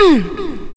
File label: "UMH"; VOICES